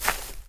grass run, footsteps; chief

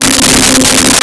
Choir Oohs C4; Nintendo; July 2020 Gigaleak

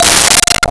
Marimba F5; Nintendo; July 2020 Gigaleak

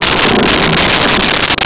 Lion Roar; Nintendo; July 2020 Gigaleak

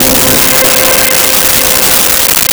Atmosphere C5; Nintendo; July 2020 Gigaleak

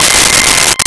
Elec Piano1 C6; Nintendo; July 2020 Gigaleak

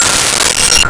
Glock F5; Nintendo; July 2020 Gigaleak

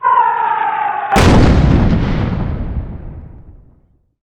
bomb plane; bombs

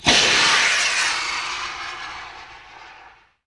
rocket, fire

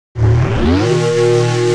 plasma weap, startcharge

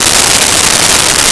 Eng Rev; Nintendo; July 2020 Gigaleak

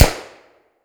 smg test

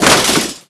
crash

